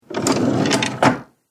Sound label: Old Wooden Drawer Close; Andrew Fleming